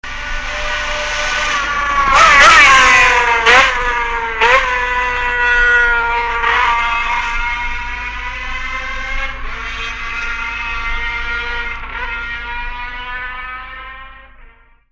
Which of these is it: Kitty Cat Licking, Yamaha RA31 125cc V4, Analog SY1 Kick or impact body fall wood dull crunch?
Yamaha RA31 125cc V4